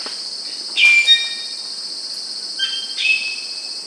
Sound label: White bellied Parrot